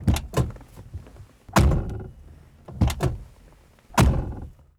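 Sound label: Vehicle Door Town Car Interior; Vehicles; Doors & Keys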